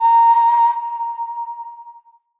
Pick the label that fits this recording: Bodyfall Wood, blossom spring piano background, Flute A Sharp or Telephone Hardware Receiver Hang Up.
Flute A Sharp